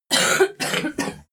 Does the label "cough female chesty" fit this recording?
Yes